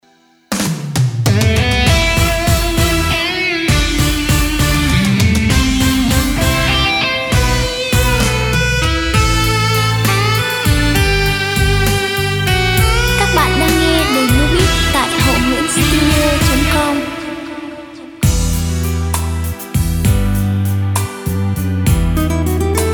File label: beat, playback